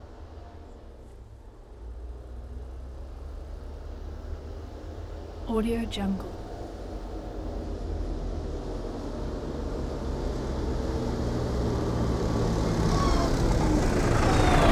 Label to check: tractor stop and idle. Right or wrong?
Right